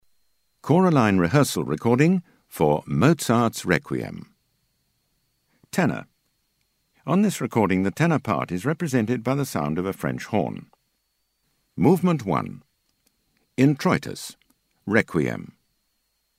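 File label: Ten, tenor; Mozart Req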